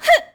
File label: Boxing Female Punch Grunt